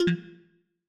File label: disconnect; backspace